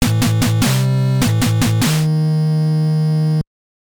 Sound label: Unused Fanfare; Secret Ties; NES